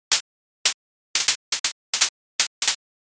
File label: sparse clap